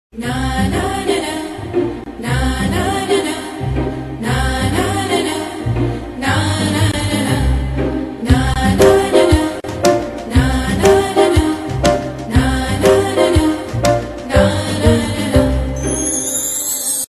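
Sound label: Na Na Nana background Tune; Tv Serials; Star Plus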